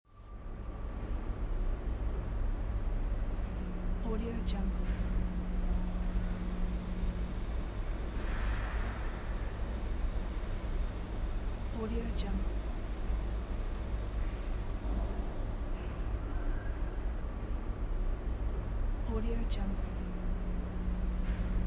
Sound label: empty room ambience storeroom and depot